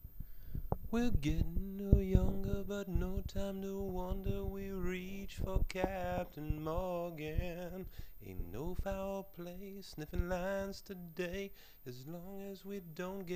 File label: hardtruth vocals